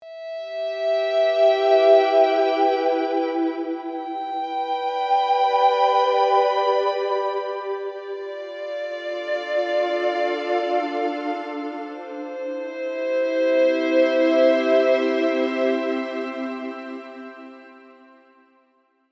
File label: Violin Ensemble